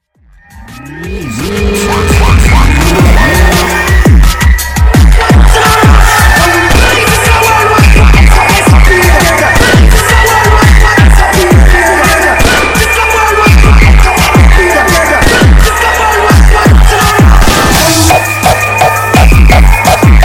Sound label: hardstyle